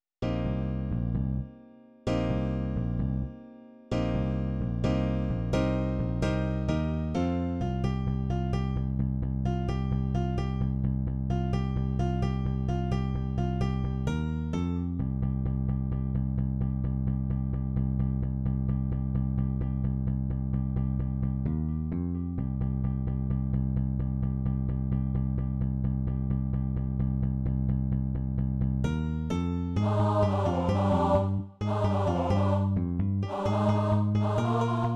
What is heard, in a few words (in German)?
Streitlied